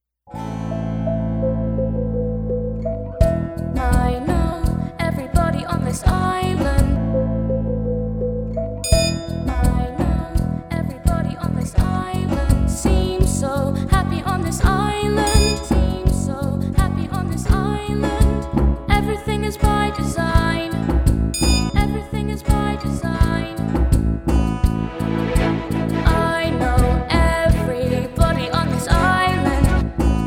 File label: echo track Verse 2 Pre chorus 2